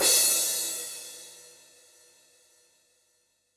CRASH, Yamaha DD-65 CYMB

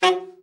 ALT SRT, ALTSAX SHORT; Giga Samples Collection

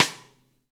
V-Pop CStick, Rimshots; Roland BK-5

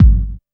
KICK deep, Techno Trance DRUMS

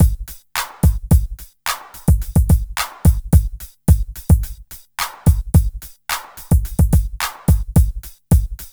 Smooth, R&B Drums

